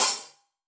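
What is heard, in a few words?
Half Hat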